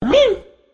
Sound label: click; monstergo; pokebot